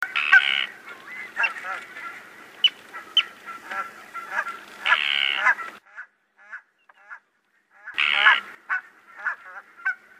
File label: Sterna hirundo